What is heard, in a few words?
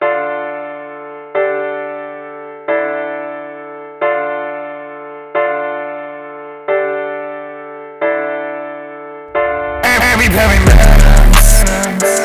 Bass Boosted